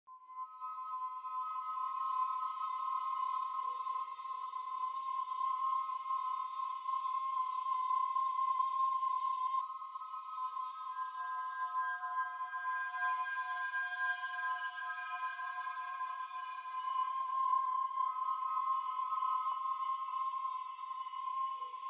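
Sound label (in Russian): заклинание магии колдовства окружающей среды с высокой тональностью окружающего звука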